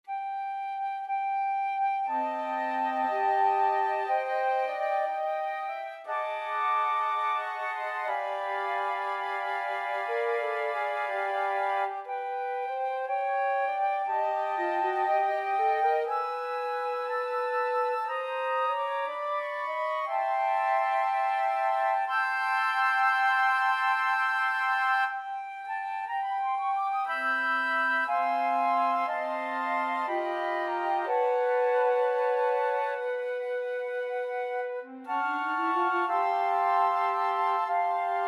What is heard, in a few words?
happy jazzy birthday, flute choir